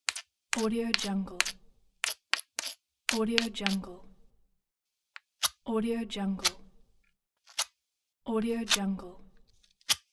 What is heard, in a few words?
starting a fire with flint